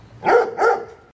Low Dog Bark

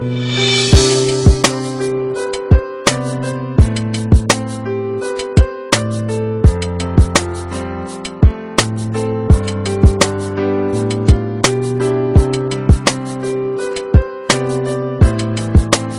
love beats, jazz

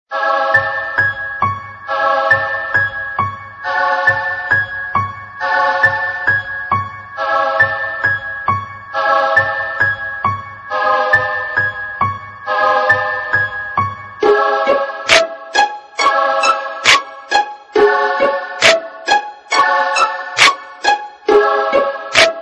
sad love piano

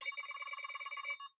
Anvil Scanning

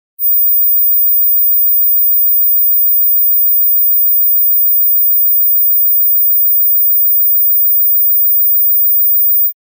dog whistle; animals

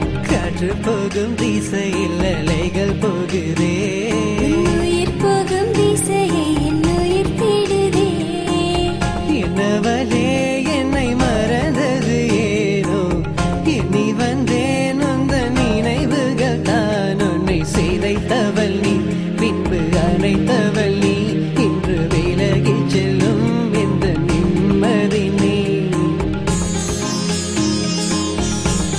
Melody

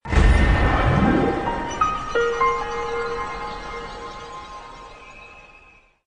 Flag Found

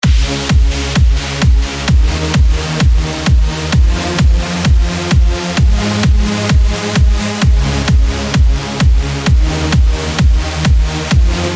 House Music

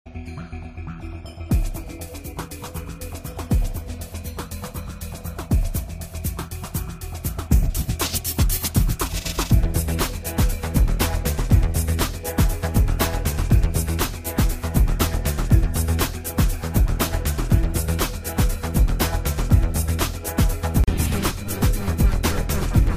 theremin effect

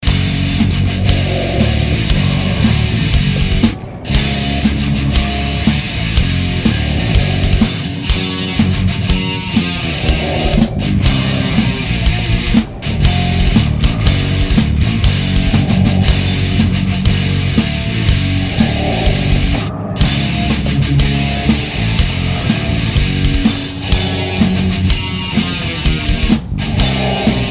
Deathmarch, ringtones

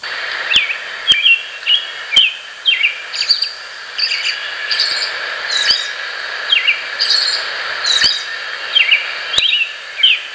american robin; animals

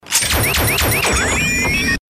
meteor switch insert; ringtones

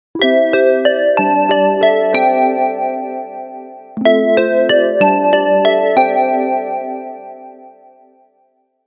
SONY Classic Ring

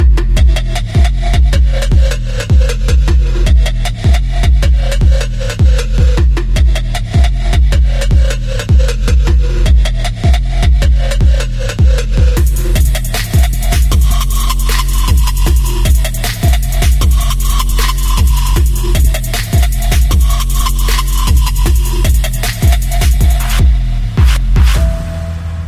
Xtreme Bass

